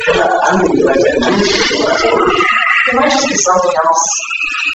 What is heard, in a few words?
unknown scream or moan; Waverly Hills; EVPS; Spirit Chasers of the Unknown